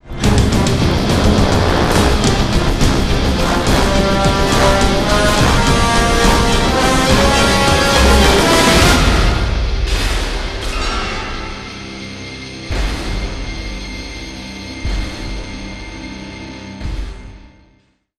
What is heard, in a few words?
big build tag; transformers war for cybertron gamerip; IACON IN RUINS